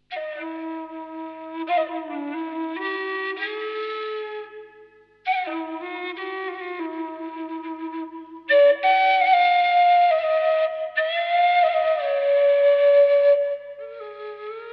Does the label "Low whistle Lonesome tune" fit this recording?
Yes